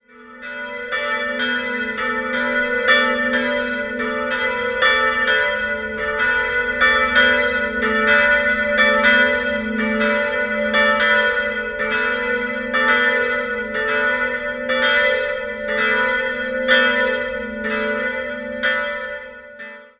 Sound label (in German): Ingolstadt Paulus, Ev. Pauluskirche, Glocken; Geläute: a'-h'; 1966; 1970; in Erding gegossen; Karl Czudnochowsky